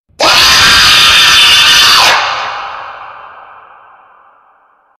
jumpscare; fnaf sl; babycircus baby minigame

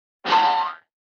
64 bit bricks breaking

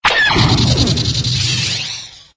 blast

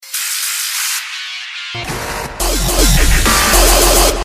voice dubstep

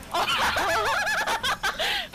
laughing

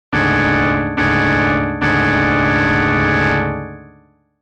alarm

